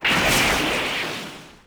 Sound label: bazooka fire; WAR